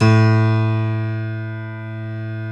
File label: GRAND PIANO; OMI - Universe of Sounds